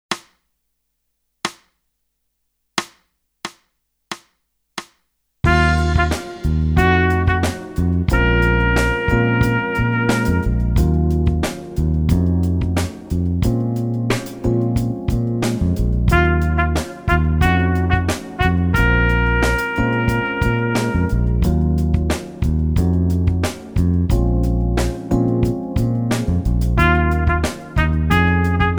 Echo Ubung

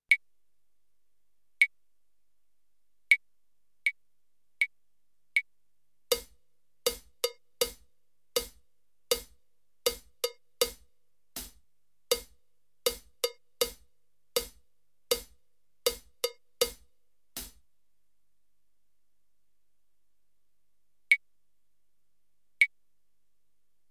Rhythmus Schreiben

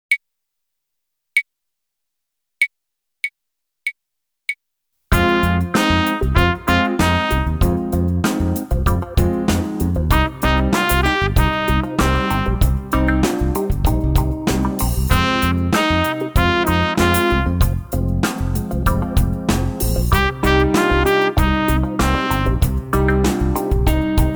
Echo Ubung

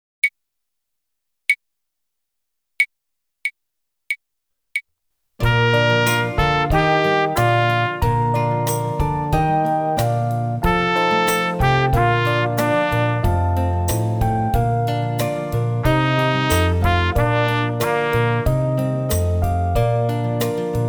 Echo Ubung

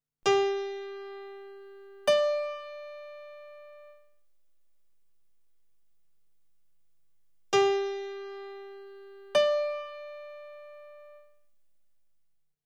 Intervalle Quinte

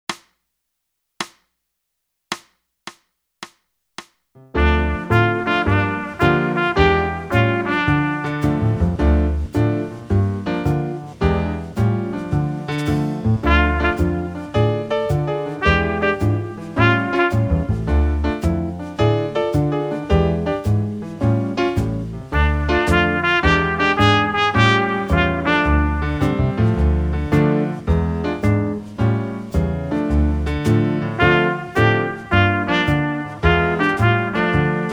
Echo Ubung

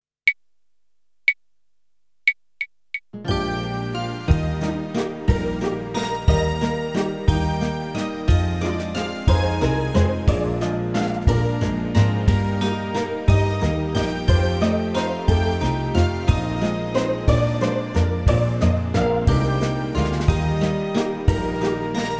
Dur Moll

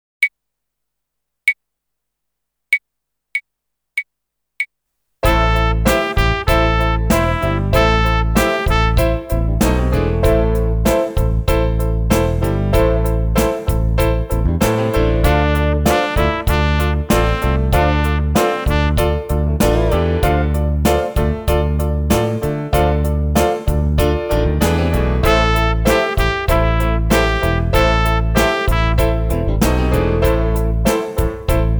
Echo Ubung

